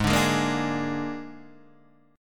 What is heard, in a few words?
Ab Suspended 2nd Flat 5th Ab, Guitar Standard; 4,5,6,3,3,4; strummed; JGuitar